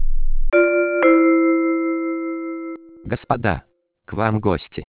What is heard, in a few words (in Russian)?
zvonok